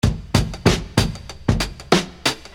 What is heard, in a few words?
Beat, Hip-Hop Street Beats